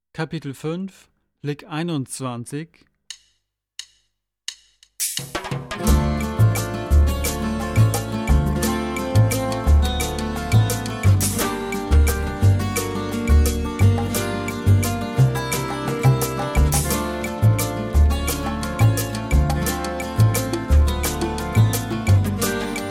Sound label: Latin Style Guitar